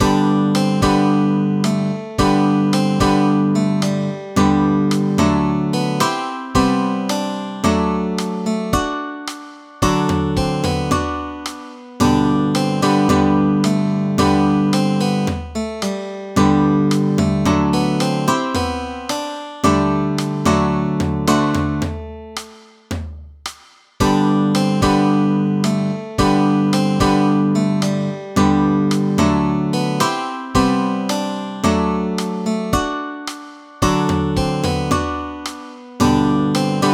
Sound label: Free Software Song acoustic